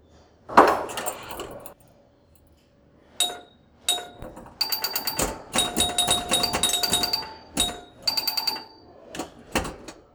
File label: pinball; field recordings; Vietnam